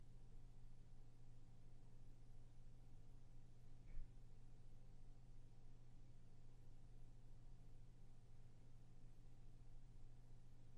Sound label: EVP